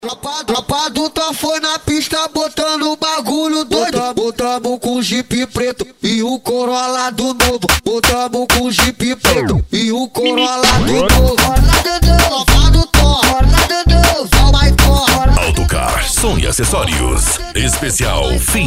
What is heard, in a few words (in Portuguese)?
funk agressivo ritmado e funkadao